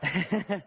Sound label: laughing